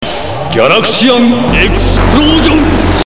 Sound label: Explosion